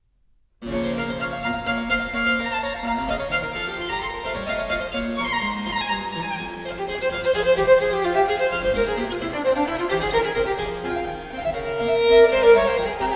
Sonata No. 1 in B Minor, Allegro, Violin and Harpsichord; BWV 1014; Bach; J.S. Bach